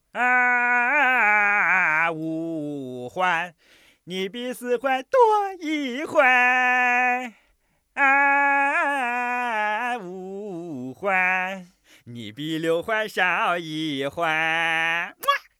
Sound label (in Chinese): 哼小曲; 熊二